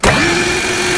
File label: sentry gun starts